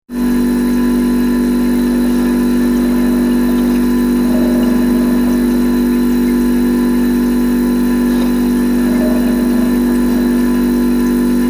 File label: Fridge Drone, Refrigerator chiller drone; Appliances; Household